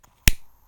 lighter

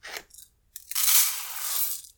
put rice in pot; cooking

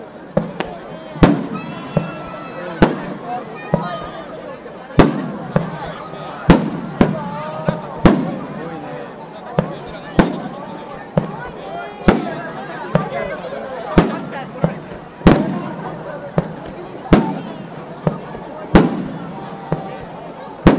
fireworks festival

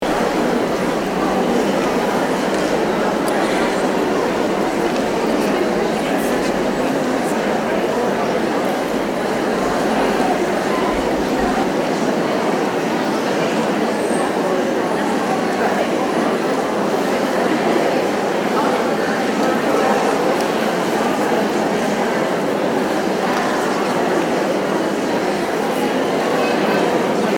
concert hubbub

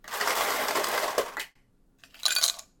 ice into glass; eating

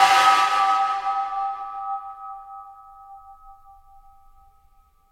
lost memory; anime

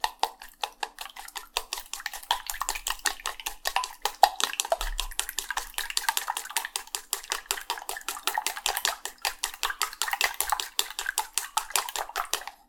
beating egg; cooking

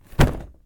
putting a box

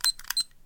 stopwatch

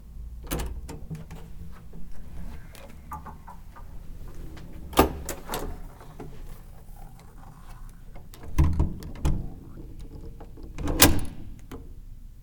out room

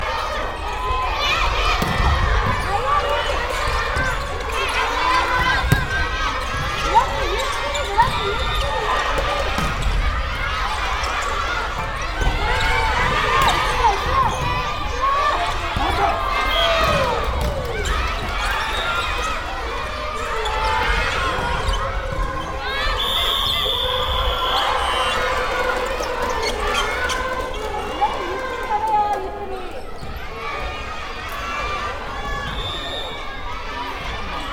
valleyball girls; sports

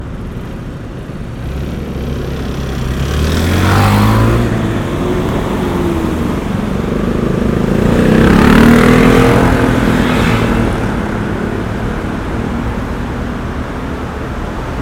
city road

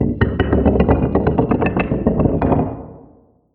device in cave

